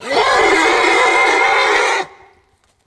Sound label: Horse Howl Painful